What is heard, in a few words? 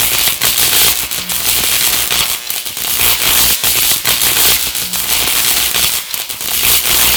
Shock Beam Loop; Warframe; Eximus